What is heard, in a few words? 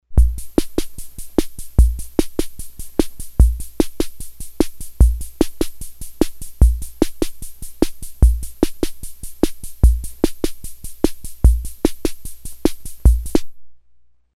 ROCK 'N ROLL, Ace Tone Rhythm Ace FR-2L